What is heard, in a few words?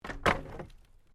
Wood Planks Fall